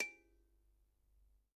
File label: tick; sugarizer; MazeWeb.activity